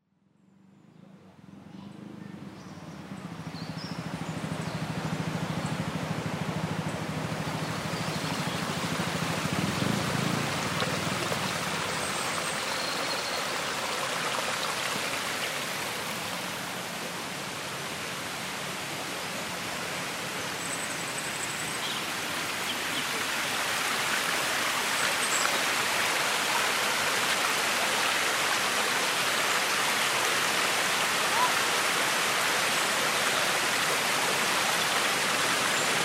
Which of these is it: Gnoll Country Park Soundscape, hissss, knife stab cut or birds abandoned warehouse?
Gnoll Country Park Soundscape